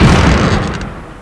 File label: Explosion Freeze; Army Men TiS